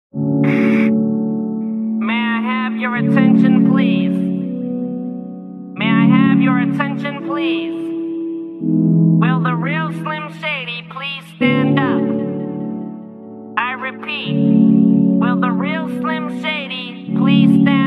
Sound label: lofi